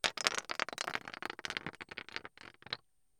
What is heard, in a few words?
Rockfall